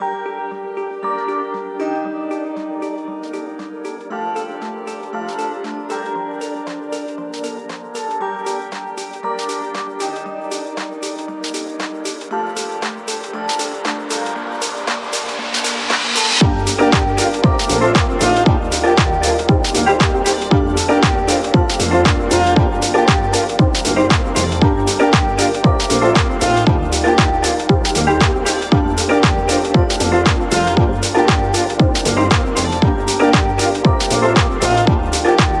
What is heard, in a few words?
electro dance